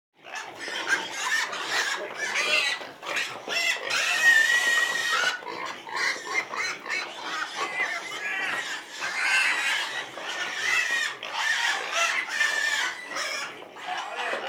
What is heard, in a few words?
Animals Birds; Sound Ideas 6003